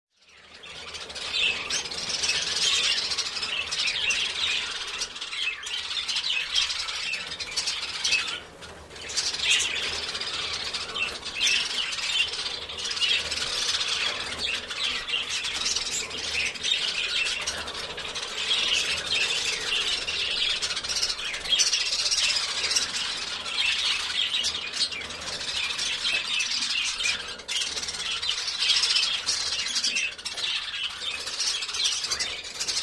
Pet Store Interior W Birds Chirping Very Cu And Parakeet Chirping Excitedly.